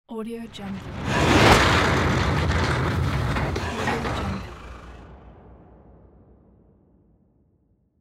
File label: hell portal opening